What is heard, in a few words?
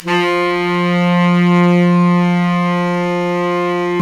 SAX, Tenors; Giga Samples Collection